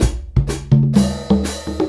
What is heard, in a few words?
Conga and Hi Hat; Combo